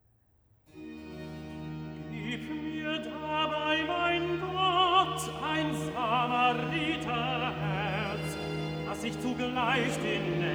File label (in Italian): Cantata, Recitativo (Tenor); BWV 77 'Du sollt Gott, deinen Herren, lieben'; Bach; Frankfurt; 13th Sun After Trinity